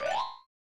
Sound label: Get Coin; Home Alone 2 Lost in New York; SNES